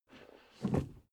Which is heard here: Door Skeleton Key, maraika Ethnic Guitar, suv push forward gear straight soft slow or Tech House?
suv push forward gear straight soft slow